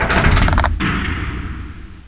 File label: ball wind; weapons; siege